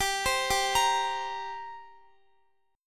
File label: G Minor Double Flat 5th, G, Guitar; Gmbb5; arpeggiated; x,x,17,17,19,17